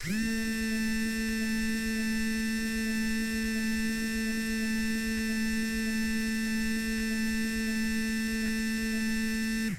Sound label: toy vibrate high